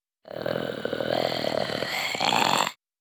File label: Hisses Dry, Creatures; GDC Game Audio Bundle